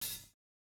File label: hihat, house